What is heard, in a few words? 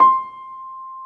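piano C6, soft piano; AKAI S-Series CD-ROM Sound Library VOL-2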